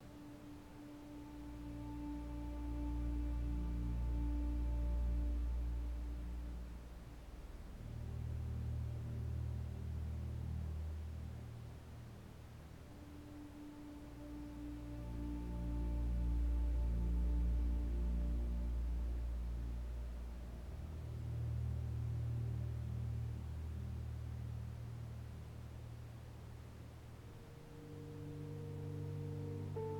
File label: baby hotel white noise